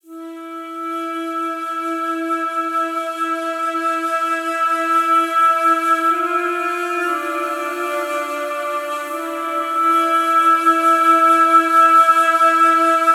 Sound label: Flute - Am 60Bpm; Construction Kits; Ghosthack; SkyRealm; When The Bell Tolls